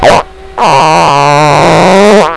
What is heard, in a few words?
fart